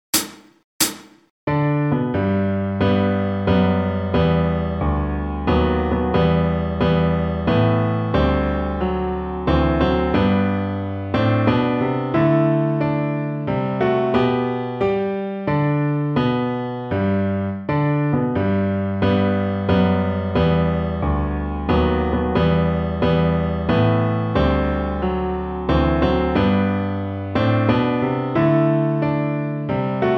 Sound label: accompaniment in G